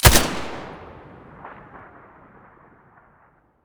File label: scar muzzle stereo